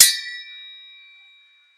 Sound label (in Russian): mech zvonkij blizkij dolgij